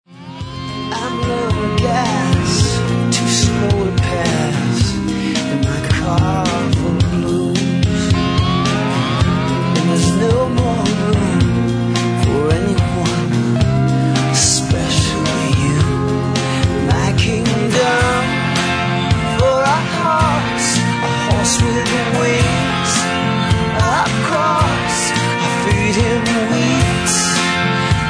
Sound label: Blues